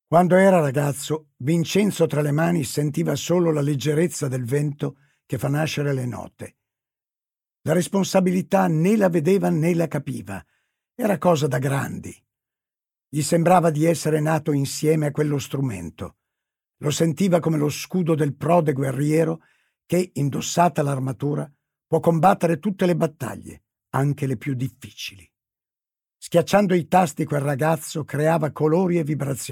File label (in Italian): antico suonatore di organetto